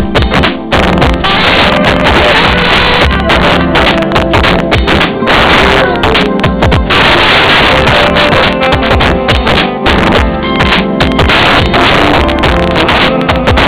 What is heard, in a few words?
radio